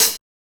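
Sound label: hi hat; E-Lab; X Static Goldmine 1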